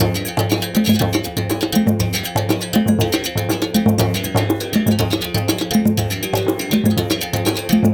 120 Berim. Samba

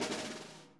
SNARE, Interactive Drum Kits

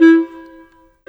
Clarinet, Cinematic

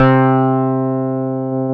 KRAFT BASS, ROLAND MKS-80; Rubber Chicken Software Sample Bank

